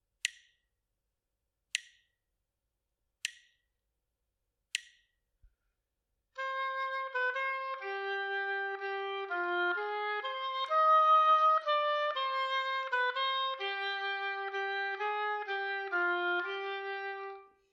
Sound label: melodic dictation